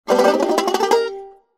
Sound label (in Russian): zvuk udivlenija bandzho